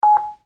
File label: digital interface beep